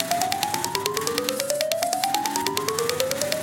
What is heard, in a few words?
wheelspin